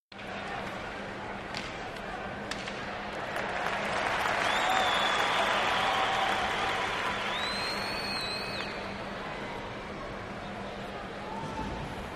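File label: Crowd Hockey Smaller; sports crowd hockey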